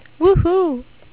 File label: woohoo